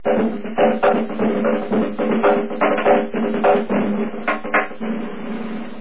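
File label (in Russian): малый барабан мелодия шумная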